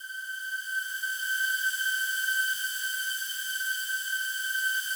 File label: slow strings G5, Yamaha VP-1; 90 s Sample CDs; Music Store